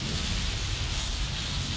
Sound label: Pink Laser Loop; gamerip; Mines